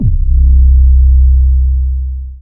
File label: Dance Floor Bass, Multisample Basses